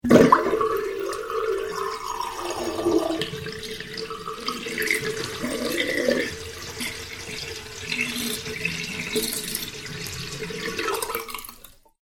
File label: household sink water empty, Plug Pulled On Full Small Sink; Water Drains With Slight Gargle From Plughole; Kitchen; McKinney Sound